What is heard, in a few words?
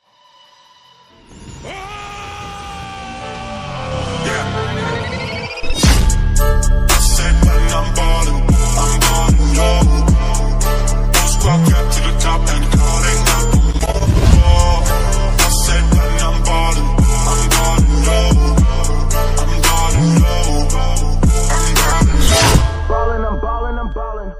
scratch ringtone